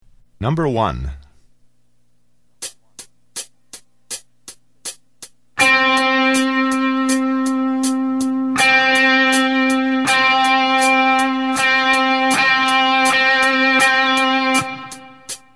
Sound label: Heavy Metal Lead Guitar; Troy Stetina